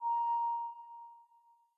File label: air